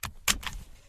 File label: car unbuckle left; seatbelt; qbx seatbelt; Qbox